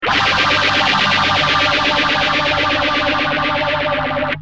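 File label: guitar, Yamaha phaser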